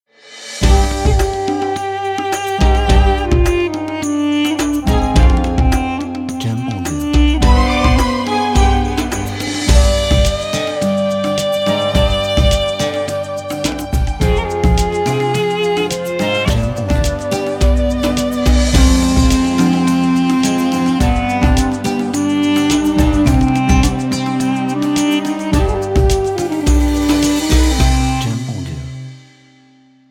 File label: Arabian Ramadan